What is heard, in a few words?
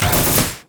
rumble carpet bomb